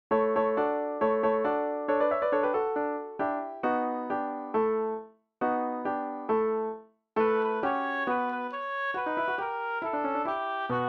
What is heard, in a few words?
Oboe